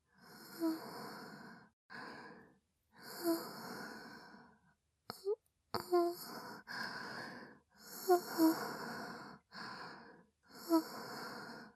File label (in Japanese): 寝息ループ